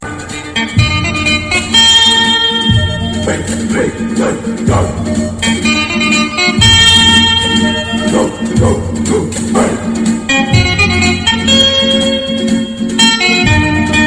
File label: Irritating Ringtone